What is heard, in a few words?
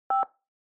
Telephone DTMF